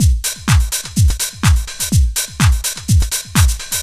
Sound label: DEEPHOUSE BEATS 125